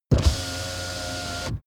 car window back open part

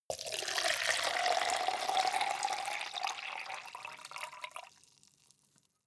cup plastic pour hard

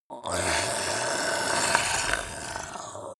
yeti hiss slimy long; kreaturen

